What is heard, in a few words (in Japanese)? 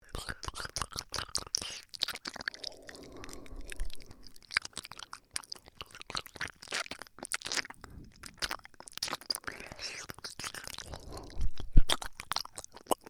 耳舐め音